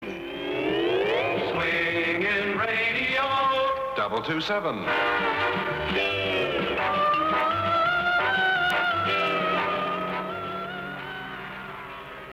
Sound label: jingle; Swinging Radio 227; Radio Dolfijn; WLLKS Pirate Radio